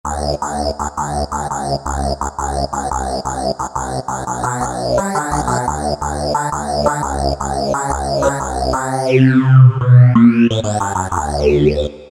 Laryngitis, Alesis Micron; Factory Programs

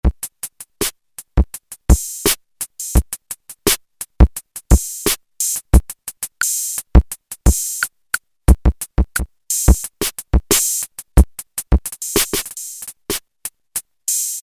Cheesy DR55; Roland L-CDX-01 Rhythm Section Instruments

